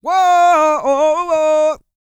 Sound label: GOSPEL; Vocal Planet Vol1 Gospel